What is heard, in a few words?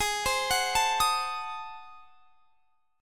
Ab 7th Flat 9th, chord; x,23,22,23,22,23; arpeggiated